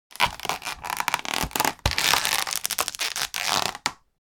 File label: Hard plastic crunch; gfxsounds